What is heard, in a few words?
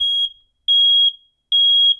fire alarm; Zomboid 41.78.16; Project Zomboid